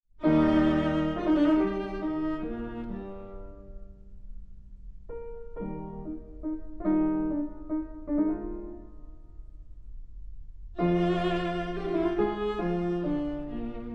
Trio in E flat for Clarinet, Viola, and Piano, Andante; 'Kegelstadt'; Bruch Trio